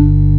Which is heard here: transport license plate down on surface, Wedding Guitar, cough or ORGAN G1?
ORGAN G1